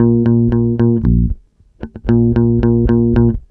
BASSE; USB Soundscan vol.32; Roots Disco; AKAI

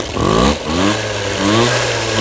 mob depar, MOTOS; East West - Global Sounds

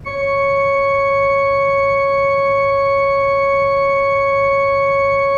Orgue Eglise Doux C#6, Pipe Organ Soft

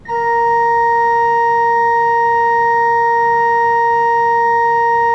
Orgue Eglise Doux A#5, Pipe Organ Soft

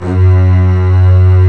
ARCO BASSE; E-MU Formula 4000 Series Vol. 5; Protozoa; Proteus2 Instrmt

